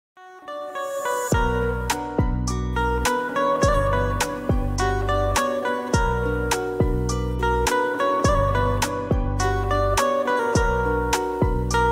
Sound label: banjo ringtone instrumental ringtone lofi mix